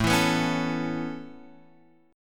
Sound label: A Major Add 9th A Guitar Open F, chord; Aadd9 strummed; JGuitar